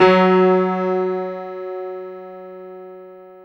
PIA MICRO, MICRO-PIANO, Roland; Sound & Vision - Gigapack II

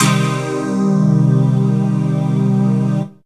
pad, Dance Synths

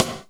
STICK; East West; Funky Ass Loops

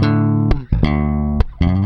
Groove Pluck, Bass; Construction Kit; Riffs, Patterns Licks; Bass X

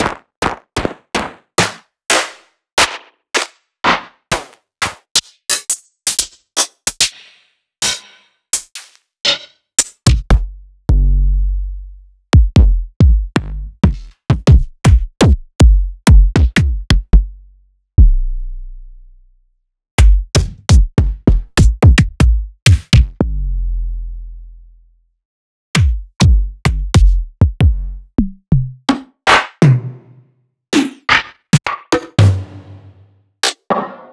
Drum Samples

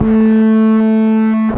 Dist Harmonic One Shot A, Bass; Bass X

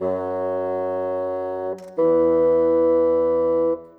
Bassoon, Orchestral; Sonic Foundry (Sony Creative Software); Orchestral VOL-2; Modern 35

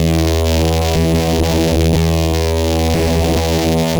Guitar Loop, 120BPM

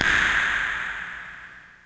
IND; Zero G; Man Machine

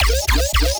BASS SHOT Hello Roboto

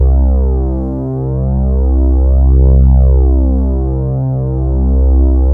poly synth; Sound Gallery - Charged; POLY SYNTH 1

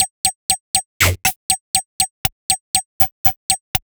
Odd Glitch, 120 BPM, Percussion; Sonic Foundry (Sony Creative Software); Underground UK House & Electro

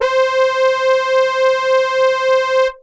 Techno leads, hits and riffs

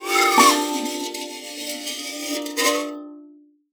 GLISS; Xsample VOL-4

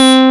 digital C5; Greytsounds; Scott Peers Sound Engineering

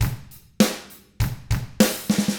ROCK, Drums; ILIO; Double Platinum Drums 1

